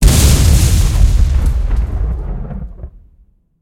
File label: super Mega Win Text Change; lost relics